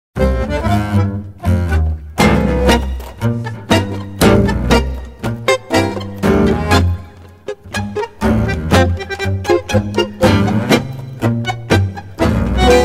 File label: tango Music